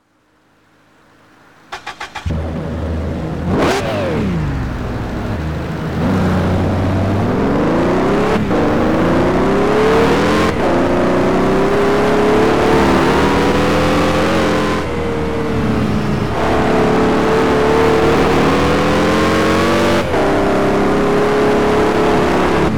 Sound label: brm v16; TDU